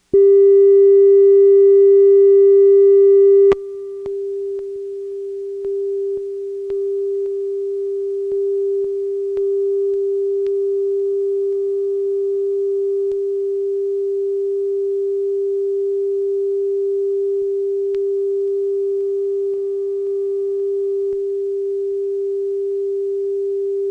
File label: autotuning decks tone; 1000zxl